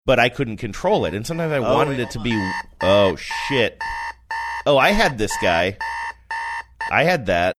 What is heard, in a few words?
alarm clock went off while speaking